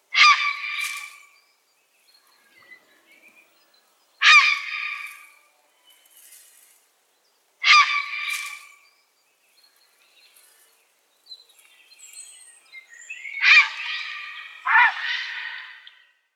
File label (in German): Graureiher Ardea cinerea